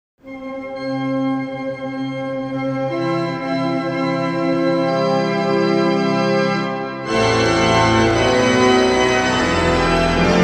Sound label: ORGAN; MENDELSSOHN BRIDE; svatby